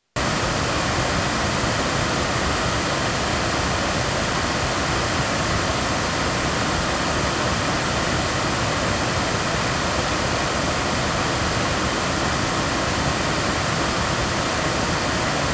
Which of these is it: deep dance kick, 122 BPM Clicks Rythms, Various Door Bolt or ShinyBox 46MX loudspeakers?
ShinyBox 46MX loudspeakers